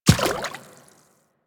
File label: Infested Medium Run Water, footsteps; Warframe